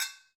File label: Brzl Agogo; Club-50 - Foundations Roland